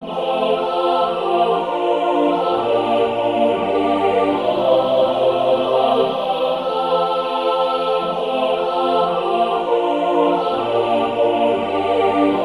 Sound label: midi